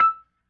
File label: E4 PERC, B3